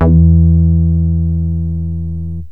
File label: oct bass, yamaha rm1x synth